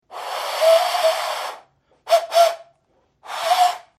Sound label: turnip whistle; thingaday